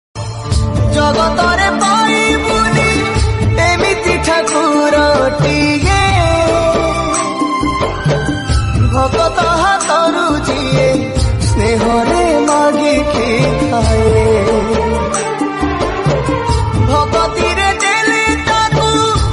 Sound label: bhajan ringtone; jagannath